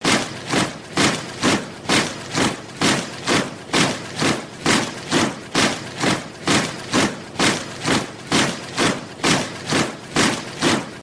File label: marching soldiers